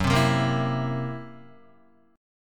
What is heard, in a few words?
F sharp 7th Flat 5th Guitar, F#7b5 chord; strummed; JGuitar